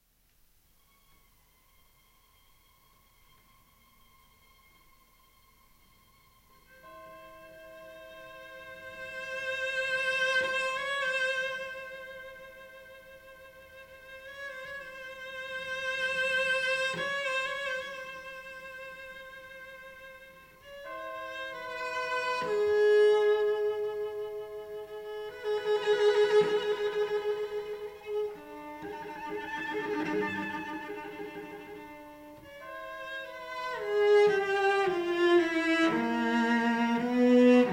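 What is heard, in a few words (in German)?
Solo Cello Glocken und Streichorchester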